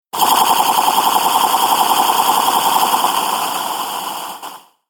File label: Alien Air Attack Force; Alien Sound Effects; Sci-Fi Sound Effect